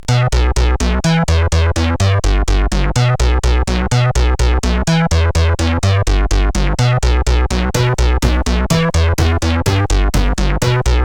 Noisehorn, sid CSG8580R5; MSK

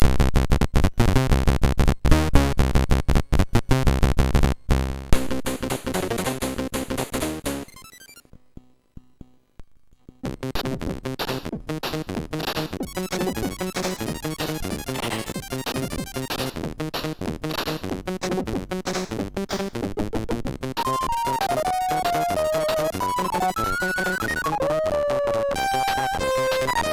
Cool Battle sid MOS6581R3; Sinc-X

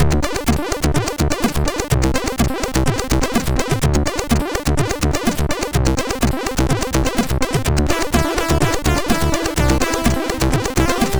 Funkriff, MOS6581R2

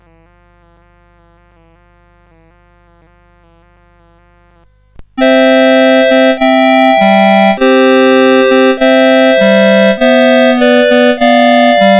CSG8580

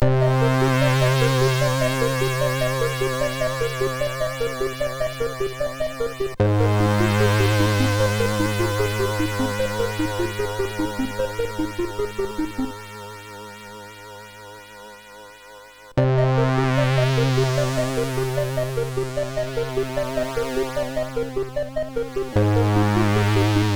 MOS6581R3; hvsc

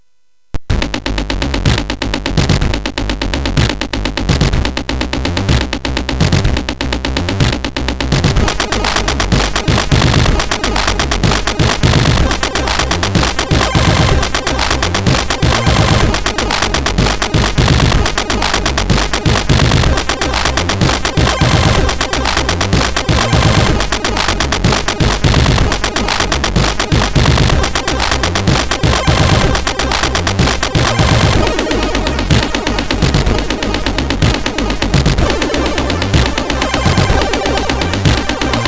Kerhioewhihmusic, MOS6581R3; hvsc

